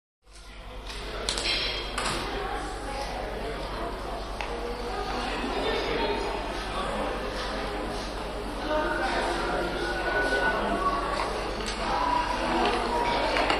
Medium Activity; Restroom; Railway Station Restroom. Light Echoed Chatter; Railroad Station; Waterloo Statio